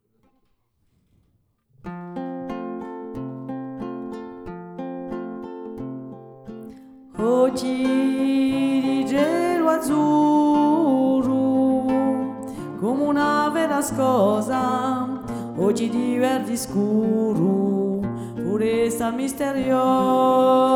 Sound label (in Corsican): OCHJI TENOR